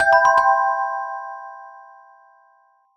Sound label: Braille Display Disconnected; quinton's new sound scheme